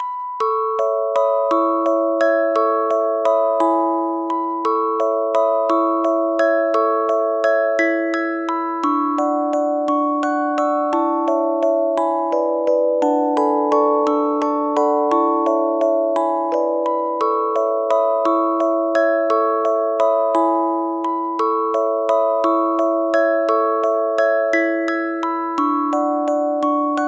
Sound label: musicbox fairytale